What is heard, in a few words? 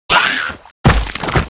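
Goblin Dead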